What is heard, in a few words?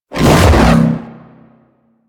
Hoverboard Jump Spin; Warframe; Vehicles; Venus; anim sounds